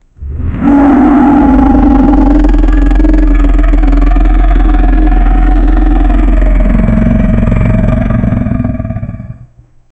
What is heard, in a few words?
an accurate tyrannosaurus